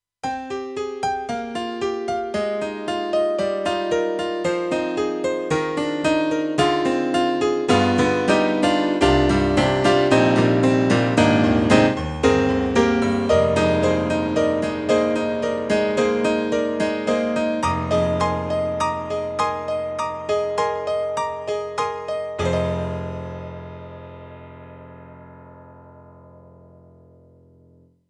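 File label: YAMAHA TG300 Bright Piano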